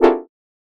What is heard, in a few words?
multimedia incorrect negative tone; dustyroom